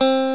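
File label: Clean Guitar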